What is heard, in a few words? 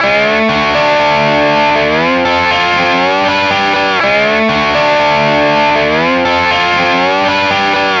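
steel guitar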